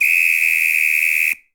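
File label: Samba Whistle Medium; Latin Percussion; Drums; Drum Hits; Ableton; Factory Packs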